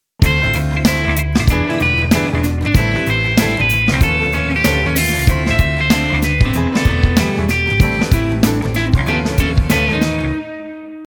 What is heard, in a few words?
shuffle